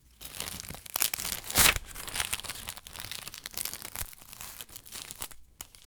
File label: Unwrapping, christmas